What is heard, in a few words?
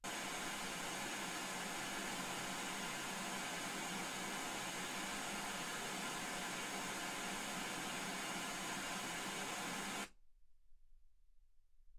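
white gaussian noise 48kHz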